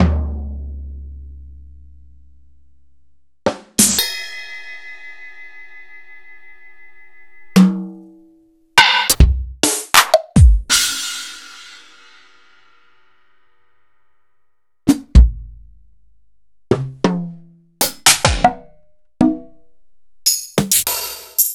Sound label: EMU PX-7; m8